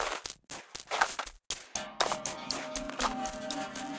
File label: Glacial Tundra Kit; drum racks; Ableton; Factory Packs; Mood Reel